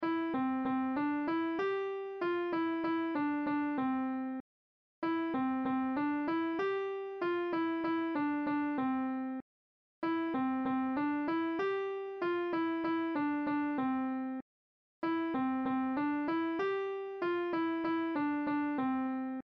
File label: Whistle; kodaly